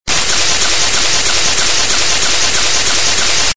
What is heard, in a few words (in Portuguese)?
fora de sintonia